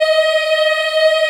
D#4 CHI IH-R, Choir; CHILD IHF

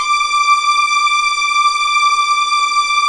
D6 LEG F, VIOLINS; East West; The Ultimate String Collection

